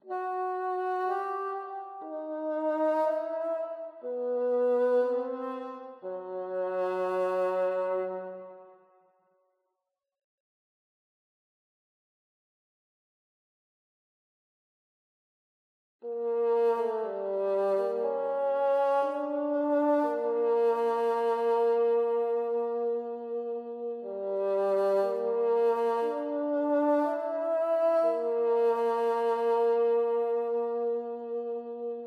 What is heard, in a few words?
Rnd Melody; Bungalow Sarco